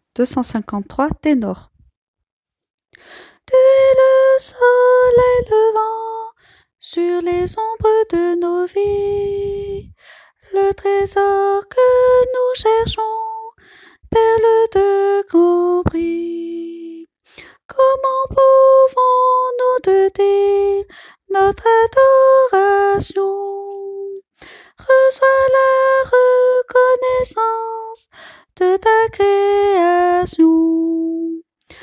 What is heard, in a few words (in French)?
ténor